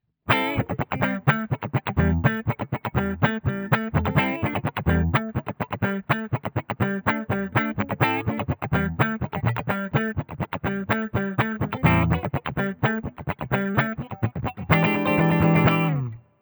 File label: clean middle gibson; custom77 cs4